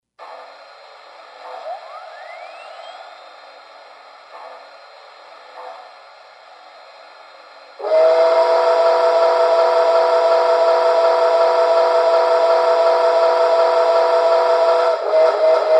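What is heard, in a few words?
berkshire, trains; CP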